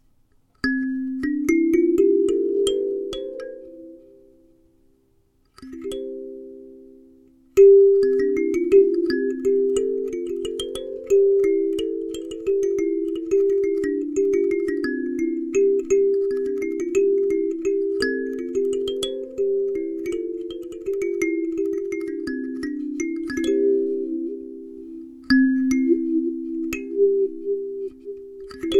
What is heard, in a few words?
Cat Gourd